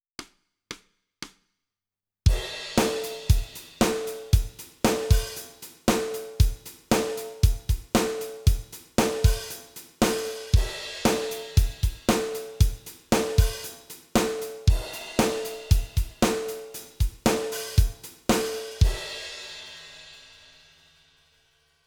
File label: Drums